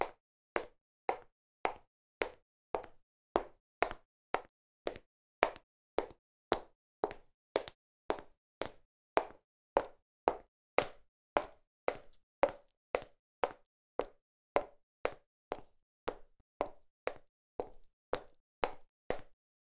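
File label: walk high heels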